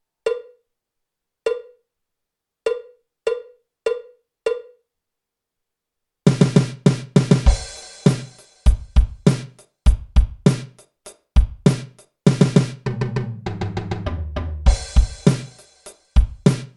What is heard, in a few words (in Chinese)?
架子鼓独奏 流行摇滚风格; 66条架子鼓独奏SOLO